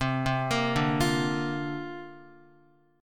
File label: C 7th Suspended 2nd Suspended 4th C down Guitar; C7sus2sus4 arpeggiated